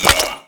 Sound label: NPC Killed